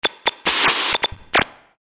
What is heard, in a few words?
Hit; Best Of N2O Library; Stabs & Bursts